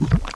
lava large splash

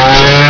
chain hit, weapons

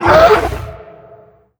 pain; gonarch

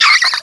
ted pain

